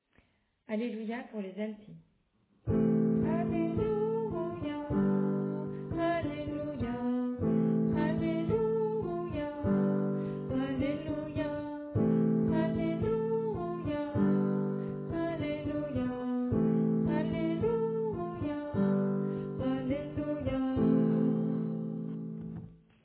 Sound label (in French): Alto